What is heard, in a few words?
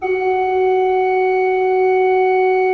RFRP F3, Cathedral Organ, ROHRFLOETE; Propeller Island